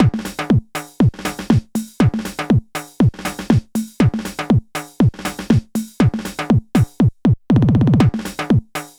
HOUSE; INIS; Funky Xtreams

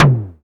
Fricke MFB712 TOM

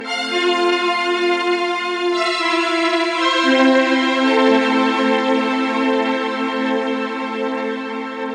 115 D Synth Crystal Tones; Zero-G; Future Wave

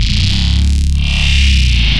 neuro bass compress

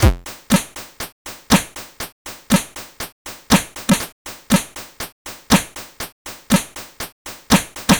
C64 Beat, chiptune drums; Commodore 64

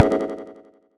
fx alien mystery; neuro; Sinistarr; Synths and FX

